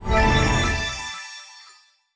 wild Transform; netent

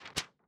real reel bounce; netent

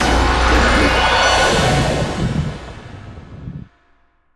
Freespin Win Attention

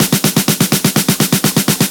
music loop snare fill; netent

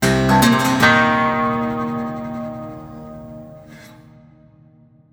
medium Win Riff; netent

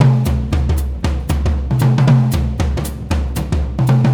pick n click music loop; netent

